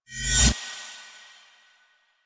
Choir Eyes End; Warframe

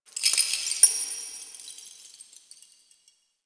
Metal Debris; Warframe; Quests; TheNewWar; Ambience; Zariman

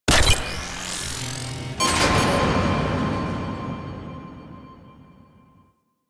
Railjack Command Hack Start; Warframe

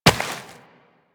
Step Rock, Footsteps Walk; DE; Warframe; Tenno

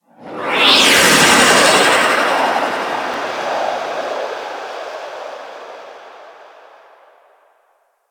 Sector Wars Dist Liset Fly By; Warframe; Tenno

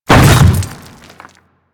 Hammer Boss Step Dirt, footsteps; Warframe; Grineer

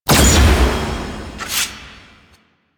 Sword Finisher; Warframe; Quests; The New War; Teshin

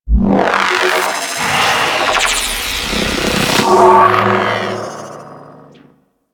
Idle Agile Power; Warframe; Necro

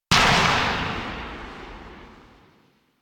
Pistol Charged Tail; Weapons; Warframe; Drifter; Operators

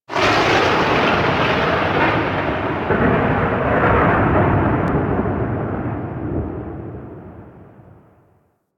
Void Storm Thunder Clap; Warframe

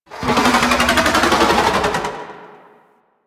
Baby Dragon Vocal Idle; Enemies; Warframe; Duviri; Void Dragon

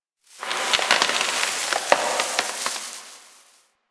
Exterior Tree Wood Creaks Angry; Warframe; Duviri

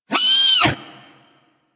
Snow Critter Vocal Pain; Warframe; Hunting Critters

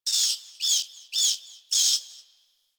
Night Zone Tree Critters, Ambience Plains; Warframe; Eidolon Plains; One Offs

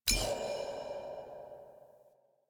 Shard Slot Focused; DE; Warframe; Helminth Shards

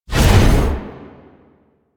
Hammer Wind Up; Warframe; Veilbreaker; Sprag

